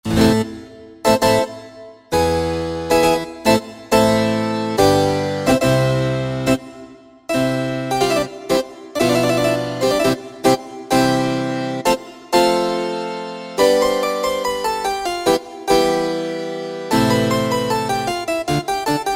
Grand Harpsi, Yamaha PSR-3000 piano; Factory Voices

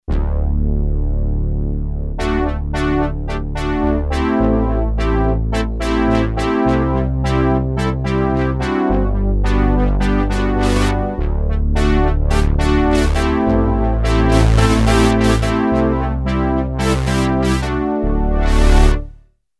OB-Poly Brass, Roland JD-990; Vintage Board

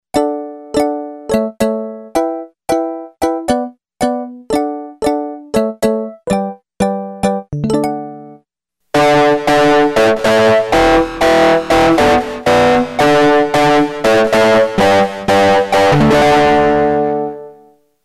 DIST.+ ECHO, Yamaha REX50

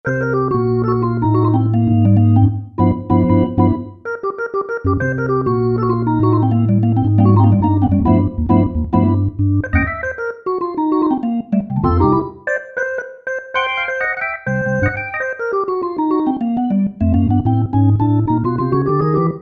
Roland SRX-07 Ultimate Keys; NiceFeel SRX

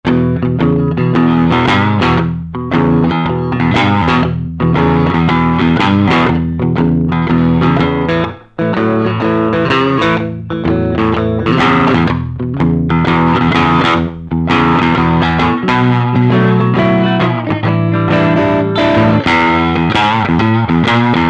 BLUE NOTE

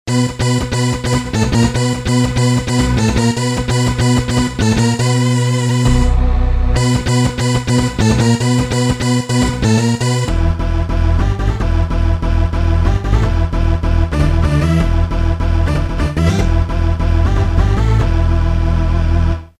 rave, Roland M-DC1; Rave at home

